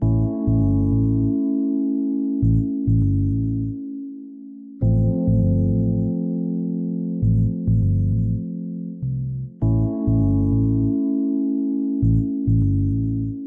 Tension Basschords

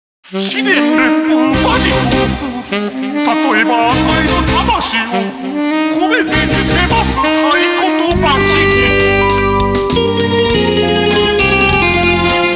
Enka; Taiko no Tatsujin Doki Shinkyoku Darake no Haru Matsuri; PS2

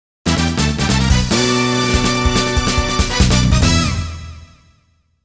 Opening Jingle; Jikkyou Powerful Pro Yakyuu; Power Pro Music Hall 2

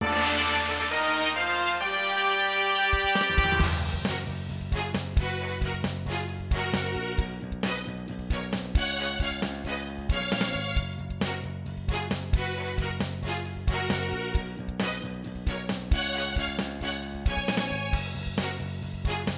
Jingle Win; Choju Giga Wars; 3ds ps4 switch

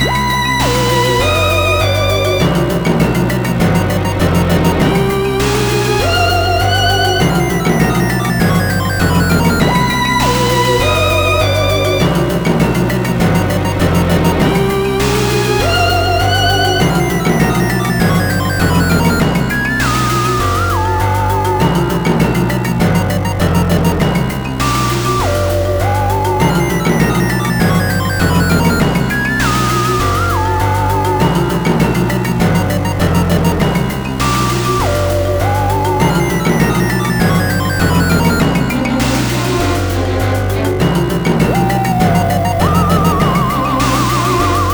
bgm dungeon

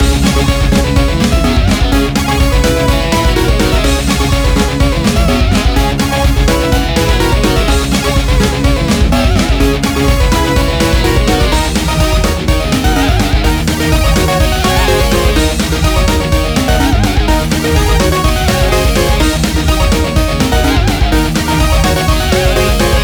Race Bgm Loop; Timberman VS; ps4

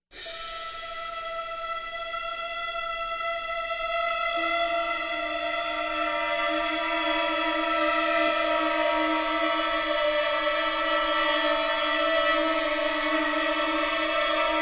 suspence short; Chrome

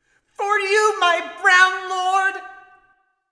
Battlecry; Traitor

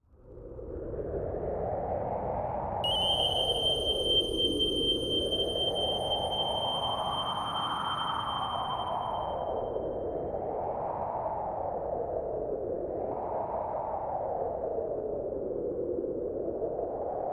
Wind; Tengai Makyo Zero; Far East of Eden Zero; SNES

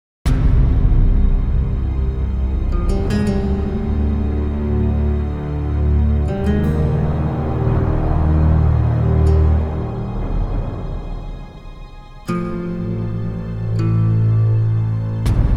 Dark Disturbing

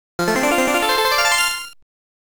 Round Clear Music

